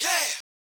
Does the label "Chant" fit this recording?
Yes